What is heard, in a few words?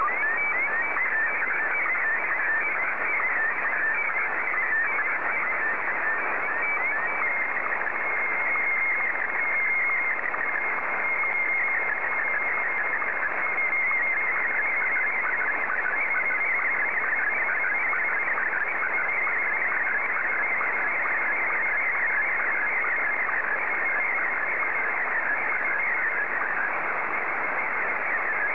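Martin 1, slow-scan television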